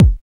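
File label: BD 909, TR-909 bass drum; 90 s Sample CDs; Sound & Vision - Gigapack I CD 1 (Roland); TR-808 & 909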